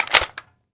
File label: clip in, weapons akm; cstrike